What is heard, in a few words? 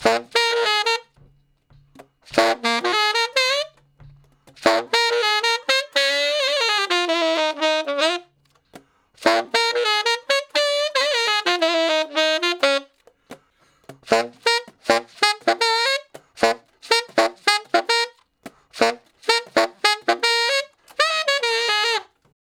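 Sax Shuffle (Bb), tenor sax; Crimson Blue and Fabulous Horncraft 4 RnB; Horncraft for R&B; Solos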